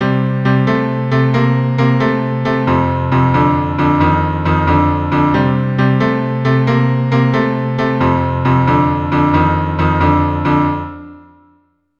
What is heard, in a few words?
Boogie Woogie bass, keyboard